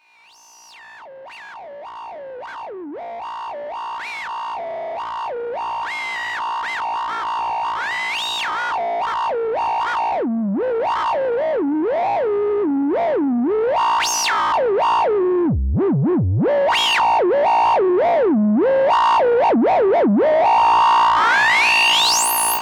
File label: Synth Sweep